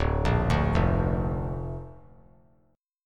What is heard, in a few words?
D Minor Sharp 5th D down; Dm#5 arpeggiated; JGuitar